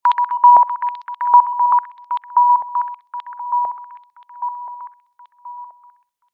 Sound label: Alien Sonar Sound; Alien Sound Effects